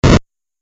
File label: 8 Bit Style Damage; Video Game Sound Effects